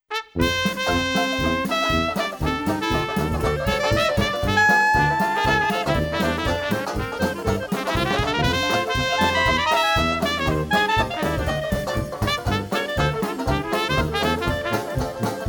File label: Quintet, Dixieland Jazz; Live Band; Hotlanta Dixieland Jazz